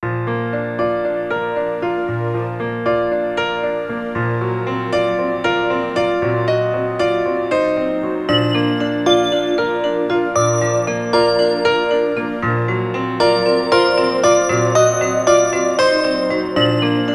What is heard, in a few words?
Alarm; PlusFourSix; Patti; Lars Edvall